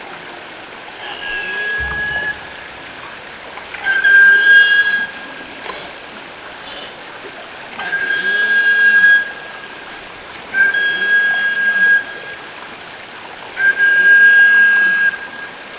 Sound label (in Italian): narvalo